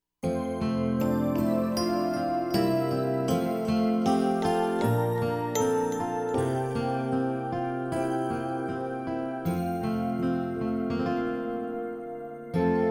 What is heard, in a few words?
instrumental; I Am Trusting You Lord Jesus